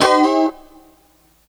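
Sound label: D 4 B mono slide R, guitars; Spectrasonics; Hans Zimmer Guitars VOL-1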